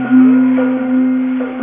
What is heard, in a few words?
FX LOOP; PlatinumPhattCD1; E-MU Producer Series Vol. 8 – Platinum Phatt; Mo Phatt Instrmts